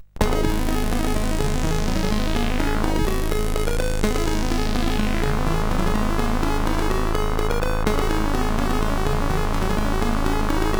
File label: sid, CSG8580; hvsc